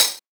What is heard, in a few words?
Tamborine, 707; classic machines; 707mod